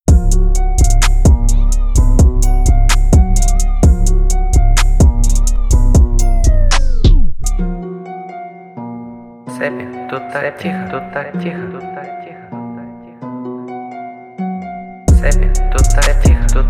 128bpm

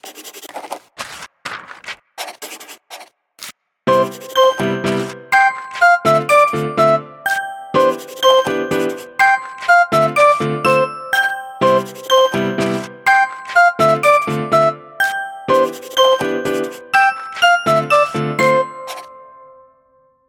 Samsung Galaxy Ringtone

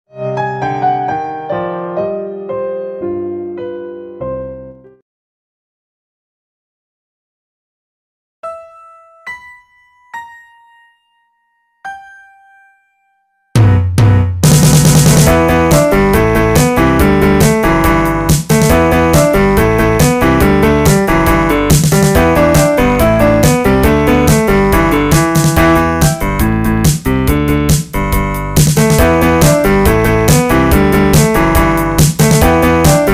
Piano

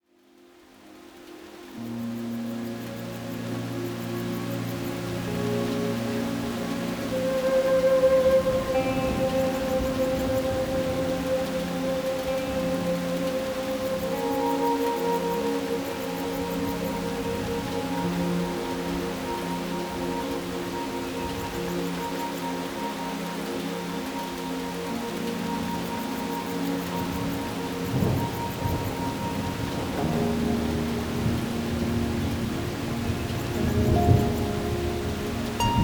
Thunderstorm Thunder Sounds Rain

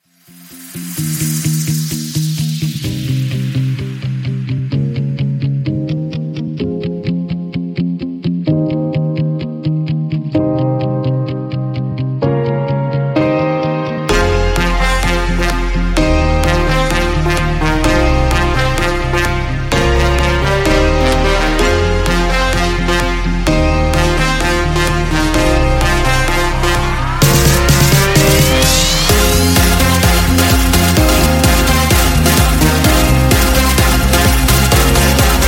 Funky Music

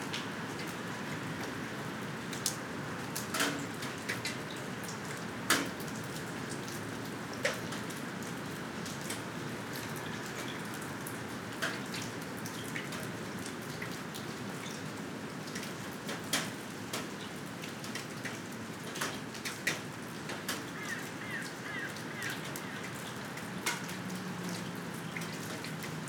Rain Leaves Evening

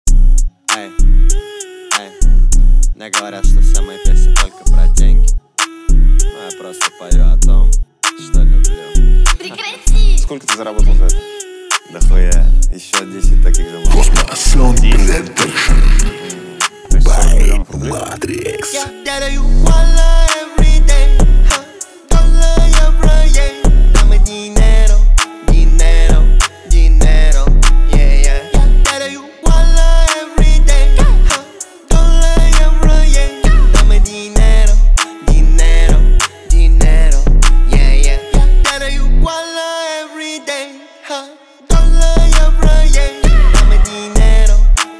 31-35Hz Low Bass

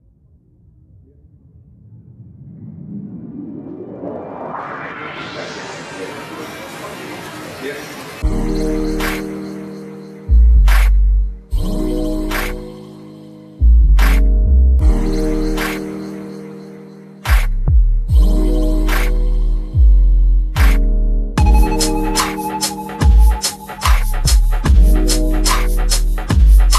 chill beat

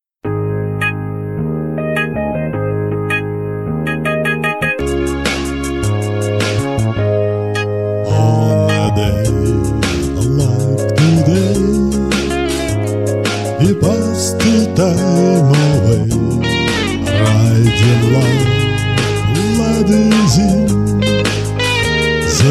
solo guitar

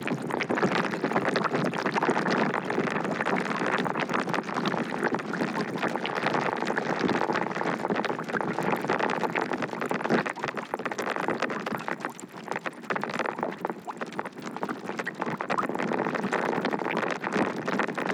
Underwater Drops; Rainforest

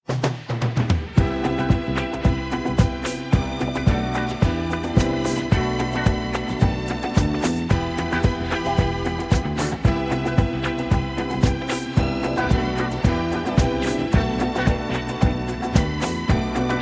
Blissful Beats

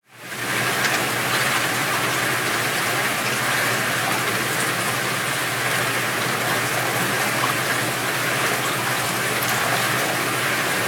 Sounds Of Rain, Pouring Alley

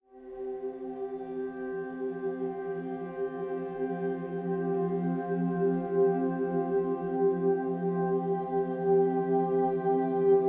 Tranquil Binaural Sounds

